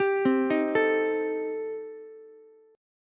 Eb 6th Flat 5th, Ukulele; Eb6b5 arpeggiated; 12,12,11,12; JGuitar

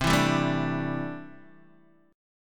C 6th Add 9th C down Guitar Open D

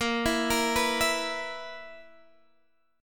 B Major 7th Suspended 4th Bb

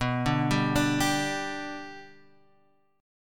G Major B

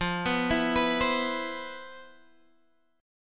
C Major 7th, F, guitar chord; Cmaj7/F; arpeggiated

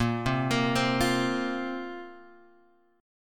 Bb Suspended 2nd Bb down Guitar Open G

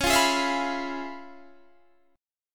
C sharp 7th Sharp 9th Guitar Standard, chord; strummed; C#7#9; JGuitar

